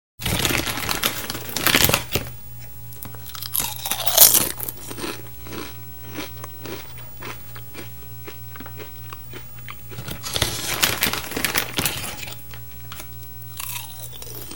person eating snack food; SOUND EFFECTS1

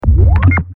bleep

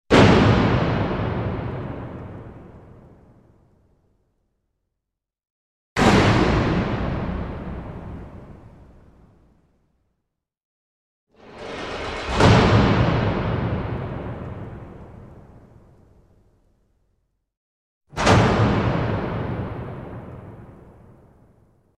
Cell Door Slamming Shut x4, Medium Impact; Hollywood Edge Sound Designers Tool Kit

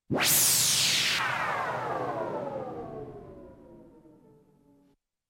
POWERSHOT

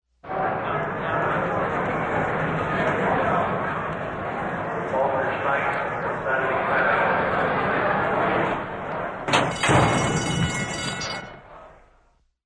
Horse Race - Start Gate W-Crowd; SOUND EFFECTS1

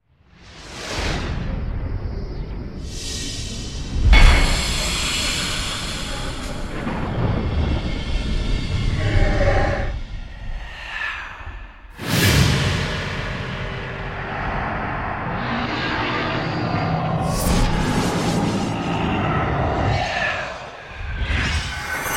Multiple Designed Sequence with Whooshes; Hollywood Edge Sound Designers Tool Kit

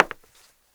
HARDWOOD, FOOTSTEPS; 1000fx

